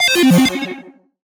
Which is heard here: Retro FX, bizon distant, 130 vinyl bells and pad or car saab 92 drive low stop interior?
Retro FX